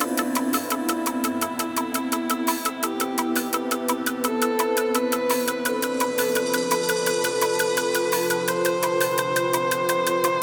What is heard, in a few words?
Mysterious Forest Field Loop; Chrono Ark